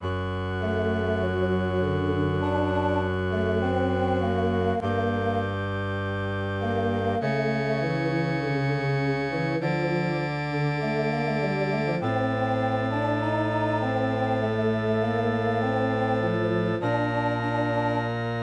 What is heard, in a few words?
MIDI